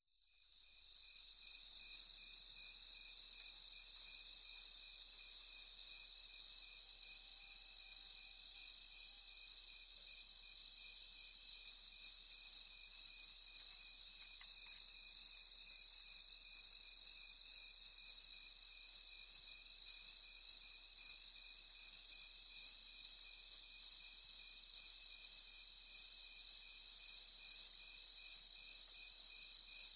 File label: CHORUS THICK CRICKETS; wildlife sounds